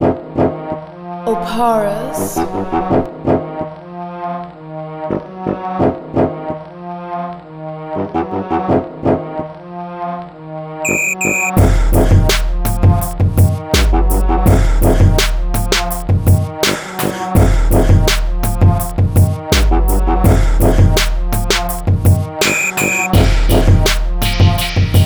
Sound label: 83BPM, instrumental